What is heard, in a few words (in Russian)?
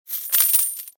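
ссыпают несколько монет на мягкую поверхность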